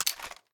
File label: reload fast mag out; gmod